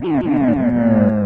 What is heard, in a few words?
hit; big Bad Baby Bowser; N64; YOSHIS ISLAND